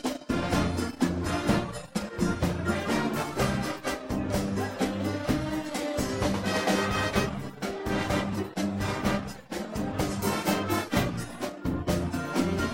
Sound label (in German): Cha-Cha-Rhythmen